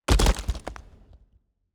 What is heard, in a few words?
heart damage stone